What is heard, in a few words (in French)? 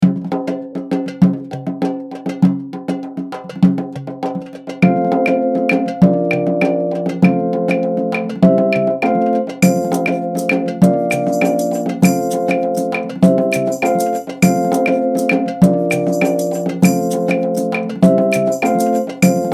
instrumental